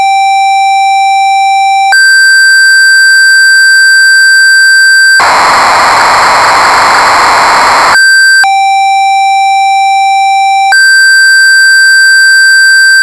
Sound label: POLY61